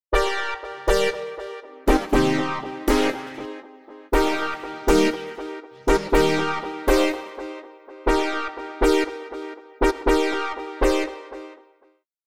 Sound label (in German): Fanfaren Djingle; la Fanfare Djingle (0:20)